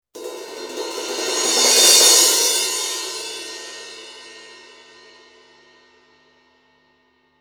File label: crash 16, cymbal; sabian